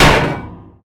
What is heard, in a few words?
wall metal; Golden Crayon Sounds